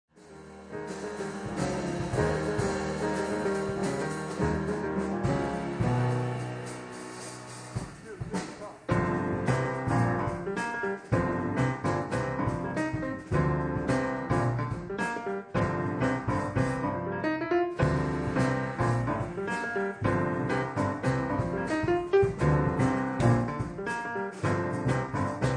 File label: trio